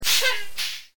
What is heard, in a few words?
trucks